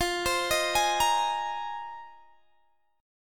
F Minor 11th, Guitar Open G

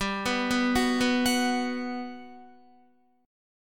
G Major 7th, G, down, Guitar, GM7 chord; arpeggiated; JGuitar

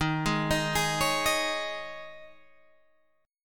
D sharp 7th Suspended 4th; D#7sus4; arpeggiated

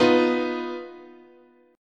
B Major Flat 5th, guitar chord

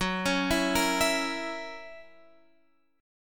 F sharp 7th, Guitar, Drop D

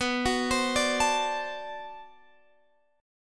D 7th, B

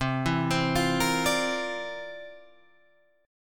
C 9th, Guitar Standard, chord

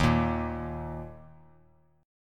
D sharp 5th; strummed; x,x,1,3; JGuitar

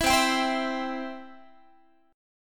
C Major, Guitar; strummed; 19,20,22,22,20,x

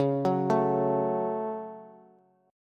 Db Suspended 4th Sharp 5th Bass; arpeggiated; JGuitar

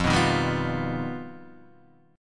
G Augmented 7th

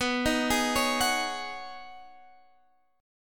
B 6th Add 9th B down Guitar Open D

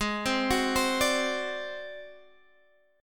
G sharp 7th Flat 5th G sharp, chord

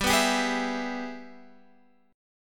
G sharp Minor Major 7th Sharp 5th, chord

